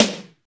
crispy snare g key, steel snare drum sample tuned to the G note; snare drum samples